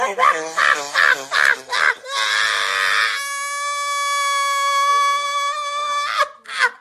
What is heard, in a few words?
mosquito laugh